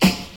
original snare b key, snare single hit tuned to the B note